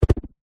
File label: throw object spin whoosh air; foley